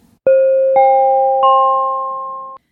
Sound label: skytrain chime only